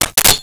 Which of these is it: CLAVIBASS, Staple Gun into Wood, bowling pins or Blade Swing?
Staple Gun into Wood